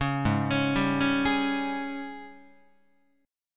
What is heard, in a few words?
G sharp Major 7th Suspended 4th, Guitar; arpeggiated; 4,4,4,5,5,7; 4A,4E,5A,5D,5G#,6C#; G#M7sus4